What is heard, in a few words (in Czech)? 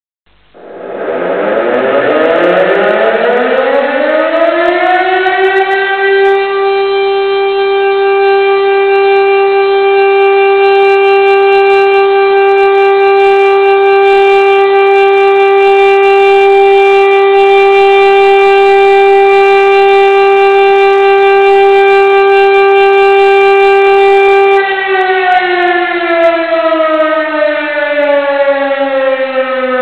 pozarni poplach rotacni